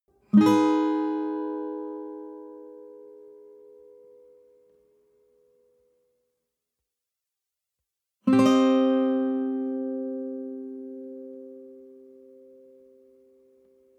western guitar chord high minor

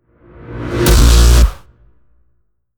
distortion

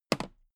toolbox handle bounce heavy

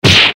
eastern fist hit seq

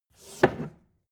take book

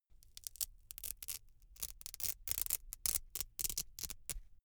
velcro open cap really slow

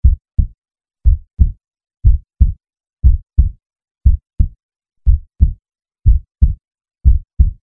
heartbeat slow

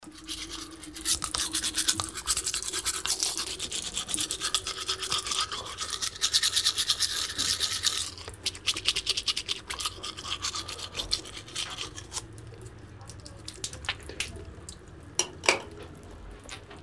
brushing teeth

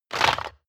crab movement cracking short

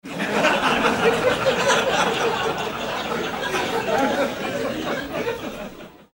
laugh group

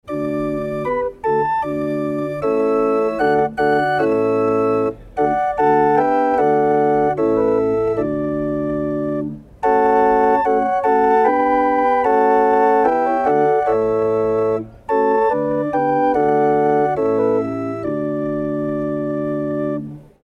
hurdy-gurdy

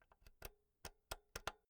insert jack cable slow

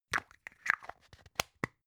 plastic spray can small close clatter

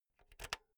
insert jack cable

